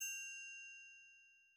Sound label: bell hi bell soft; angband